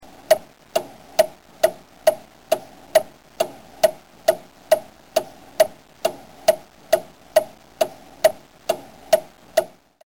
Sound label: Clock Tick